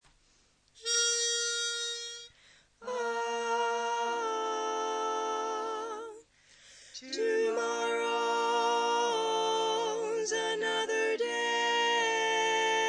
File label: Tenor predominant